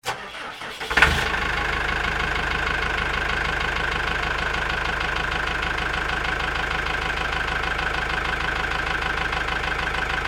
Tow Tractor Run, Industry, Tow, Tractor, Start, Idle, Off, Diesel, Engine, Pettibone; BlastWave